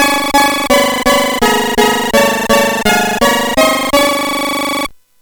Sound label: Sweep, CSG8580R5